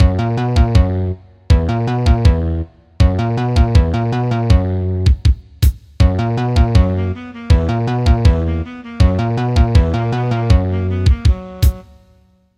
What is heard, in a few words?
ringtone roaring